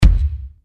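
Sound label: drum bass drum hard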